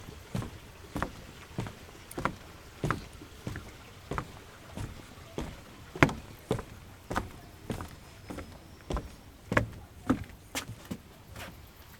Wooden Bridge Walk

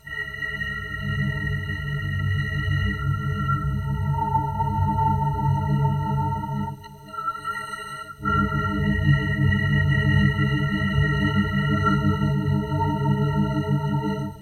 Eerie Alien Transmission

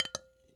Clickity Glass Bowl

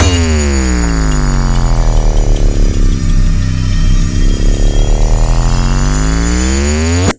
Time Stop

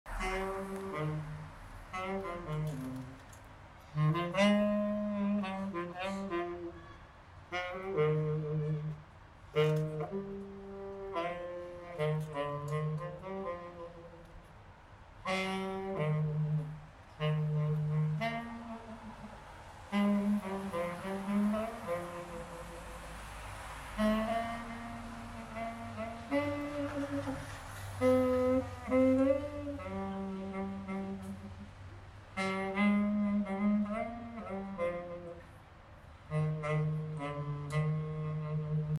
Saxophone Being Played On City Street

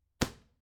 Arrow Hit

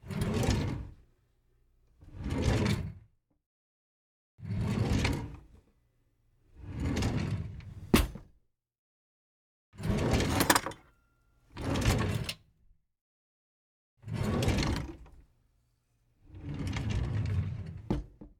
Household Drawer

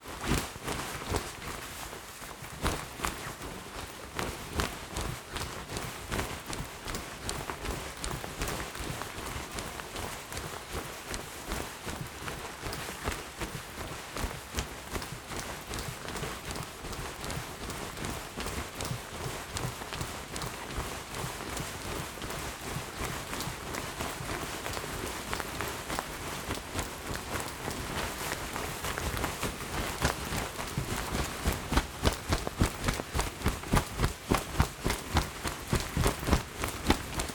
Clothes Rustling When Running

